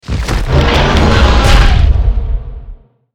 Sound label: Sfx Boss Death; Godzilla Smash3; Sfx Boss Femuto Death